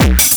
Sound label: oonce